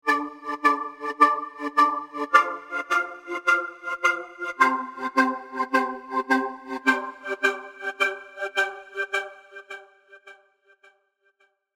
serum seqs arp; chiqui audio

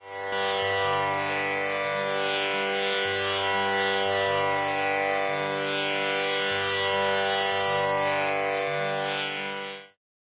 tanpura drone g binaural

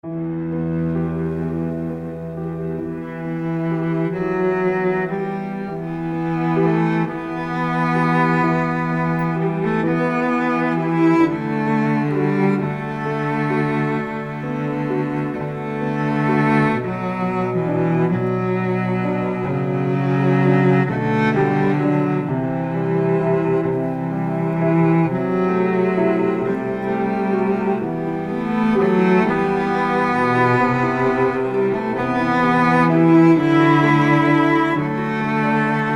modern romantic cello

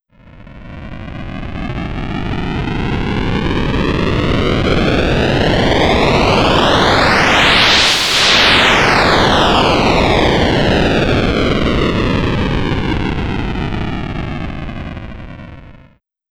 colorful noise passing throu

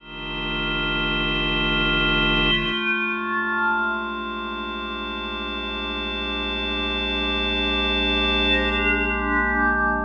lost psychedelic land

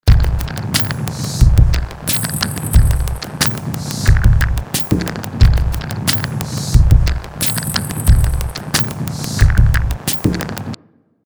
minimal static

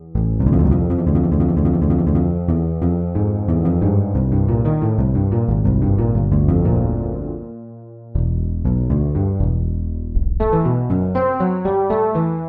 korg 01w bass; suite

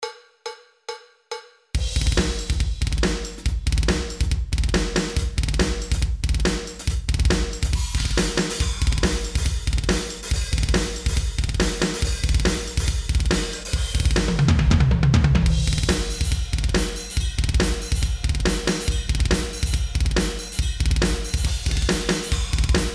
drums default; taldrum

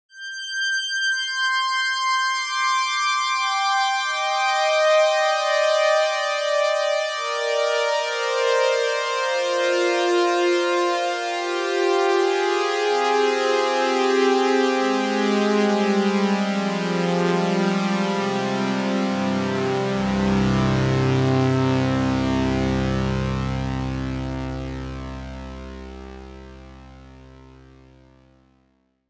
super strings; pro12; ob lush super strings

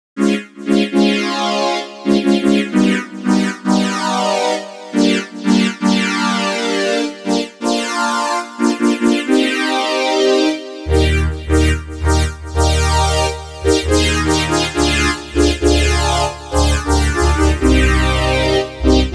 lead the power lead saw

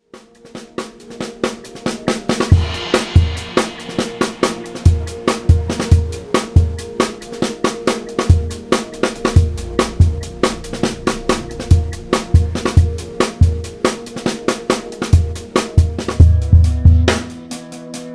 break crate

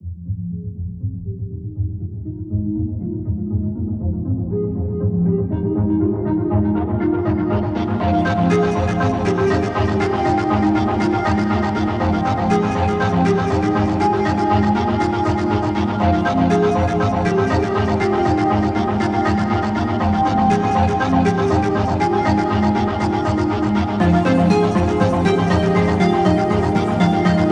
found keys full instrum

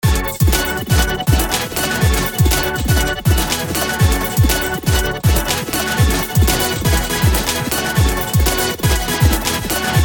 melody particles wet

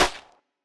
snare; groupie; nova sound

